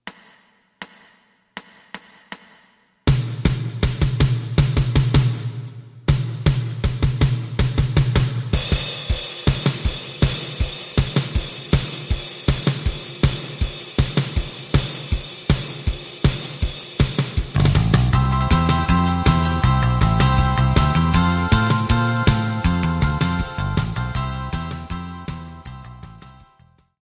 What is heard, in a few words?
lead